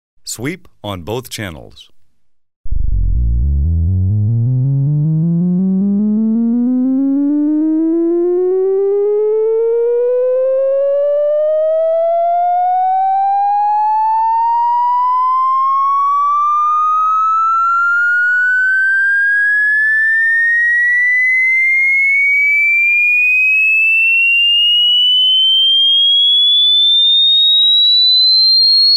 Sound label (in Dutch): Links en rechts een sweep van 20 Hz tot 20 kHz, -10 dB